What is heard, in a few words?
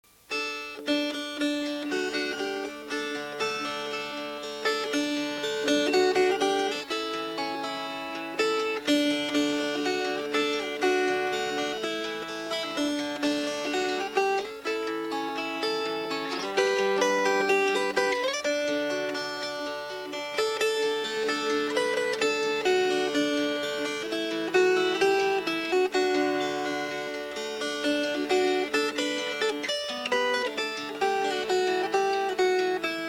Fingerpicked